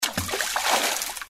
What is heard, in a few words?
splash; bruitages